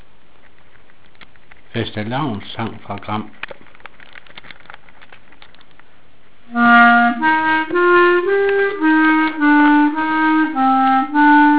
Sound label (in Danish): klarinet; Fastelavnssang